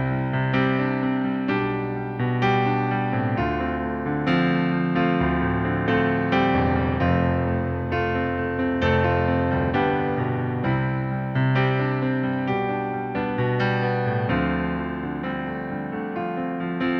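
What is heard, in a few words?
piano playback C major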